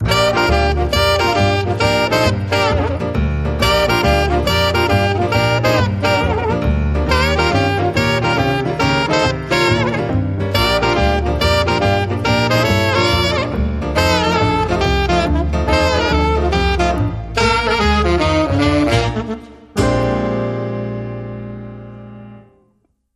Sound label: Boogie Goes Jive, No Drums